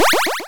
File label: 8 Bit Powerup